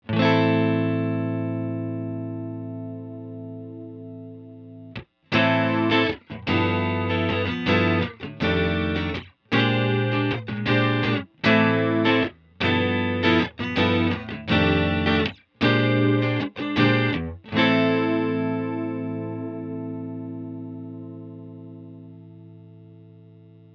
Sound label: Chorus Two Speeds